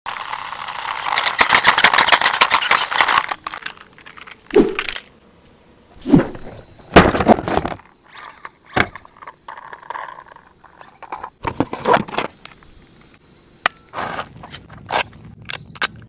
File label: remote control car; ماشین کنترلی